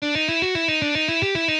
Peremennyj shtrih udary vniz i vverh Downstrokes Upstrokes